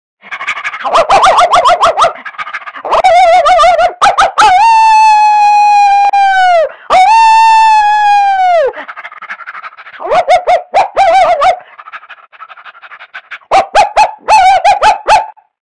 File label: Dog Barking Howling Sped Up